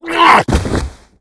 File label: Mush Room Die; Seer; GamigoNA